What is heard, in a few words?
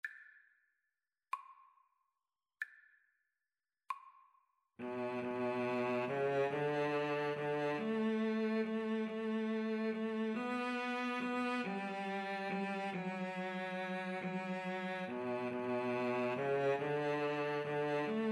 violin cello, Violin-Cello Duet